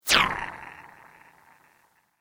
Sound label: Sweep Organic Fast; Created sweeps, swooshes and other movement effects; Sweepers; Sound Design; SoundEffects-Foundation4